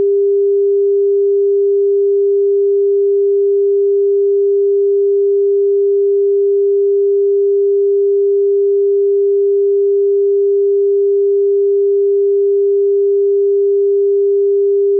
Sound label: rectest 400hz; rk pcba test